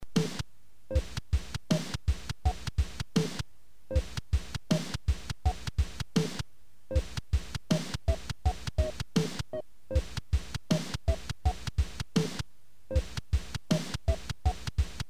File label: Beat